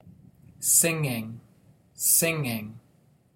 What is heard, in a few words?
singing